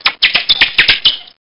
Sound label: bat roam; blood fresh supply